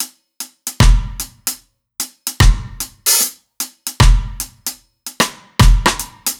Normal Kit

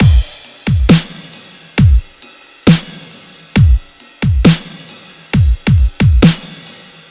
Massive Kit A, 135 bpm

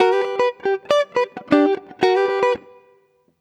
Swirly, jazz

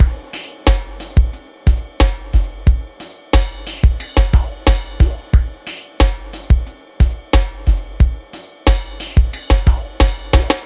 High Pressure, чиллаут

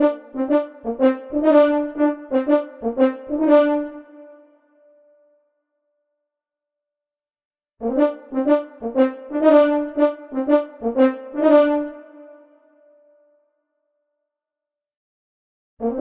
French Horn

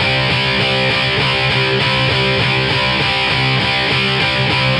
Charisma Rhythm Guit, metal

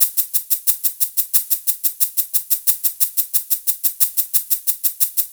Perc Set D, перкуссия

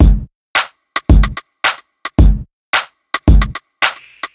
Snap, ритм н блюз

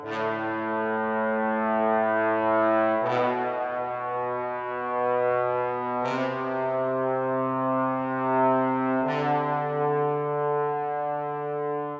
Trombone; тромбон

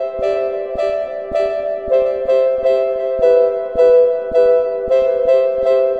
Piano Flute, ambient

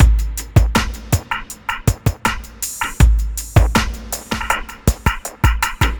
Cellular, ambient

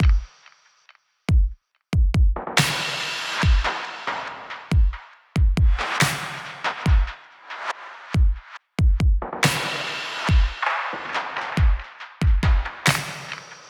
Butter Drums, Trap; Трэп

